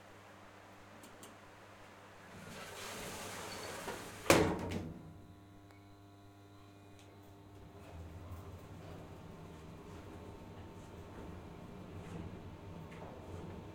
Inside Lift doors closing ascentig doors opening no voices; Italian ambience; Federica Vairani